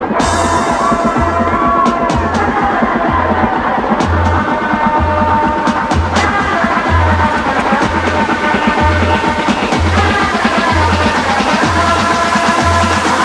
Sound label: carioca